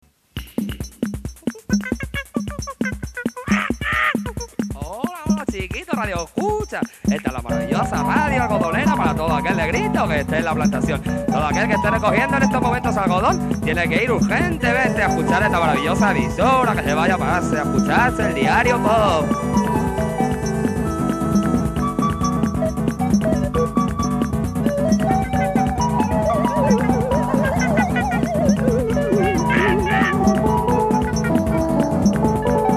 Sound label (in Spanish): CORTINILLA; DIARIO POP RNE